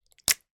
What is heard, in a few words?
horror guts bloody splat squelch in plastic bowl